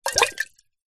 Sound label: household teapot ceramic tea inside movement splosh around lid off